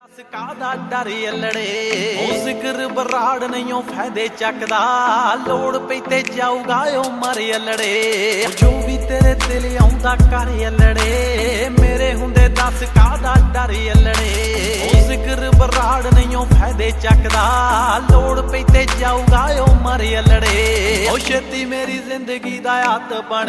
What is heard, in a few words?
punjabi ringtone